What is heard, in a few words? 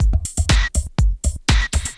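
GAMMA LOOP, Techno Trance; E-MU Formula 4000 Series Vol. 2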